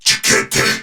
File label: Surprised; Warframe; Battle; CorpusCrewmen; CrewmanC